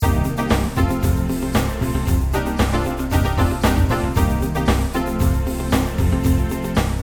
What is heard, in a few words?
Funky noisy 4k, HF substituted by noise sounds slightly washed out